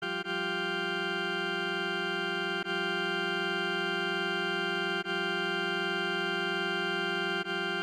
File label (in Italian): seconda